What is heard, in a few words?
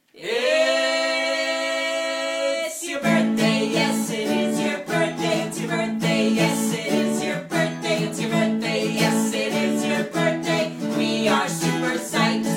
Birthday music